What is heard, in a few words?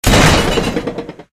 portcullis up; Source Engine; tf; rottenburg